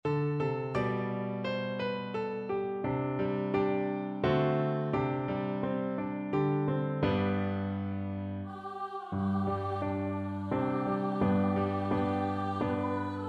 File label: piano acc; Education; National Anthem Eng 1 & 8; MANX FOLK AWARDS